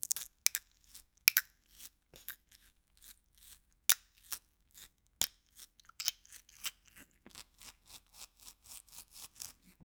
a knife slowly cutting th

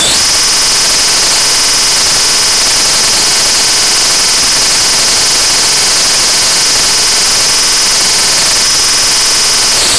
satisfying gam, mid frequency

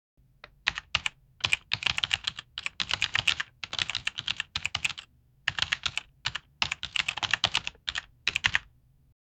asmr keyboard key press

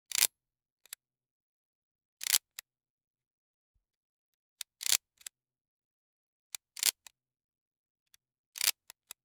camera shutter clicks; photography

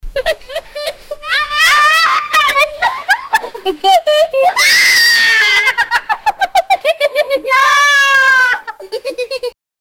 kids laughing

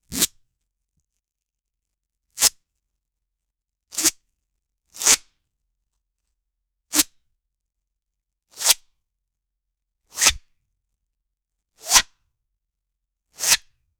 struggle squeeze tight; challenge

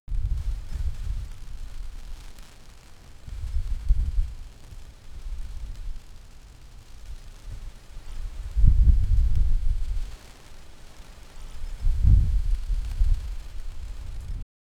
realistic sound of flags

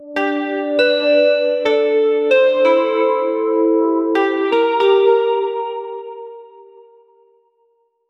slow melodious, film score

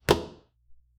a medium weight object

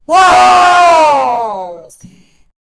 painful yell, retro games; doom 1990

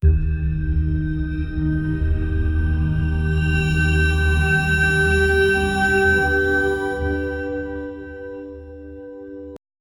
dark ambient indian mytho

